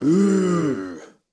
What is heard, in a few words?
Crowd Booing; Pitch Black Audio Pong